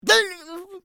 Pig hit; bad piggies; android; mobile; windows

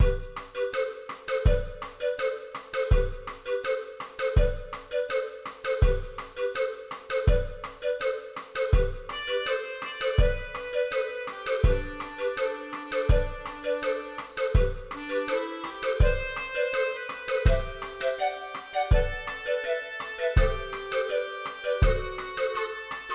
Snow; super mario maker 2; SMB1 Snow - Edit

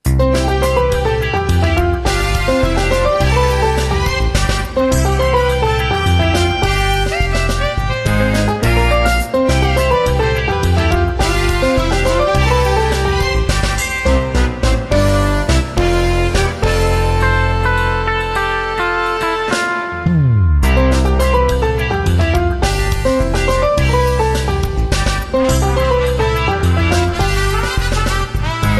BGM Menu Latin; Magic Sudoku Numpla Oekaki Puzzle; psp

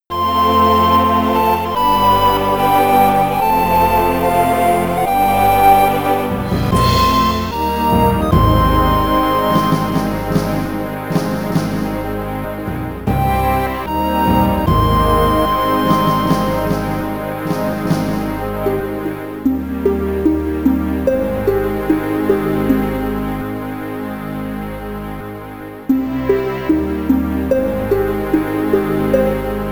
Victory!; Foundation; amiga

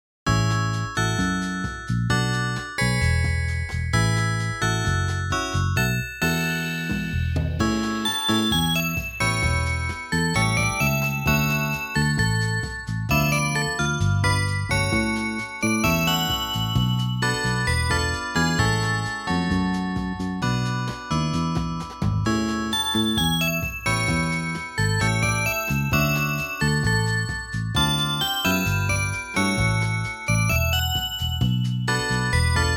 Peaceful Dining, FM; Etrian Odyssey II Untold: The Knight of the Fafnir; Cityscape

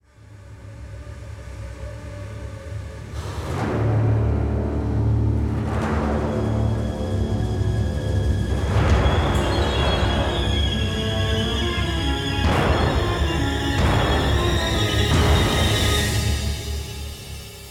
Hatchery Music

